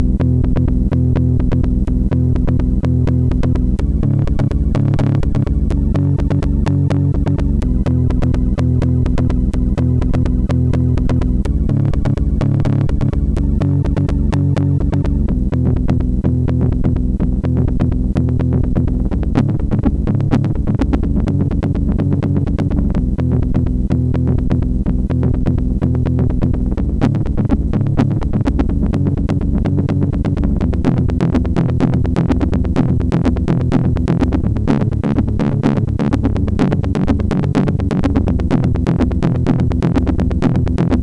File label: Alien part 2, MOS6581R4